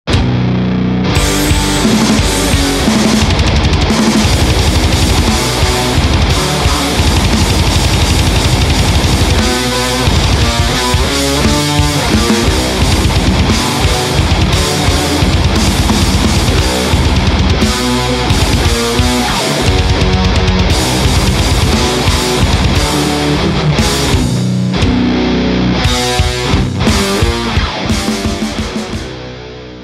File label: Tight Metal